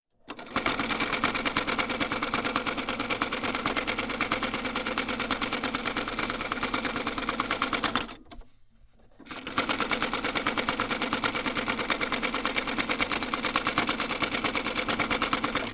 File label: sewing machine series